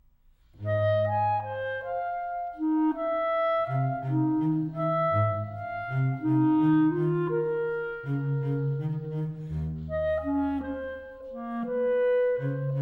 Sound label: Clarinet and Double Bass, Lazily moving